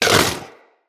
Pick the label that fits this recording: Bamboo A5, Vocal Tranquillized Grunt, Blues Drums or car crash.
Vocal Tranquillized Grunt